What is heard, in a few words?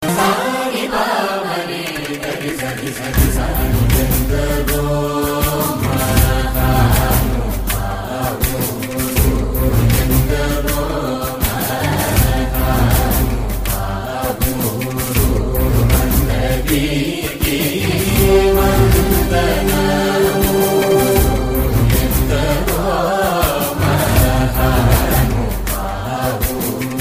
Classical Remix